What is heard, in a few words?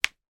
human knee joint crack bend; sound effects one